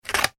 office scissors cut card single; Scissors Cutting Card ( Single Snip ); McKinney Sound

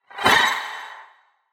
Ye Olde Magic Whoosh; whoosh sound effect

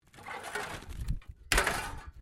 METAL SCRAPS MOVE; Metal (Sound Effects:Materials)

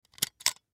Cap Gun Open Chamber Fast; Toy Gun Sound Effects; Foley; Toys; Blastwave

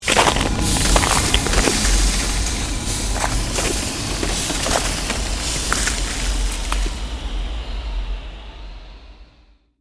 CREATED MAGIC SPELL BLIZZARD SHARDS; A series of magic or arcane spell sounds designed to suit fantasy themes, Arcane,Created,Fantasy Magic,Ice Magic

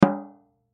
Drum Snare; Snare 14 Inch Pacific Snare Off Single Hit; Drum Kit Sound Effects; Blastwave

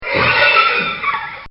Horse Scream; Horses (Sound Effects:Animals)

